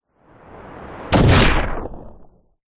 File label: science fiction impact explosion spacecraft blow up missile; zapsplat